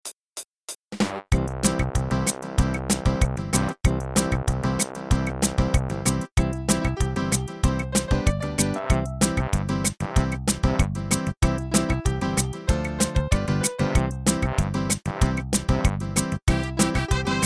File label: Karaoke